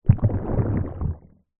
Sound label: human stomach gurgle hungry or ill